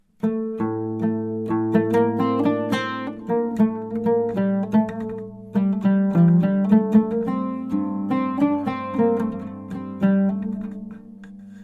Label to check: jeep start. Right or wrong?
Wrong